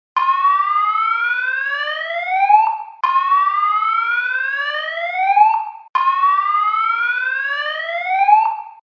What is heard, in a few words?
slow whoop